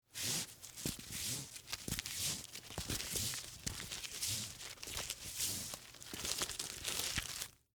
Rope Roll Up Heavy, Industry, Rope, Roll, Up, Heavy, Rope; Hand Tools; BlastWave